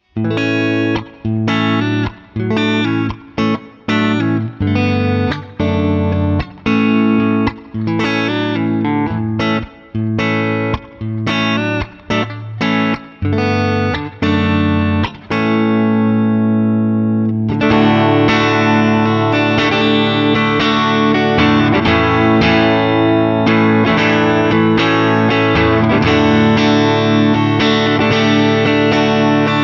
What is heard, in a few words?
Scuffham Amps S Gear Compressor American Clean Compressor Strummed Guitar